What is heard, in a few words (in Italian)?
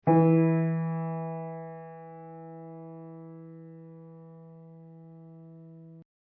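Mi2 new, Replica di martelli Pleyel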